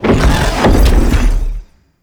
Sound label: Teleport In; Enemies; Abilities; Warframe; Zariman; Void Angel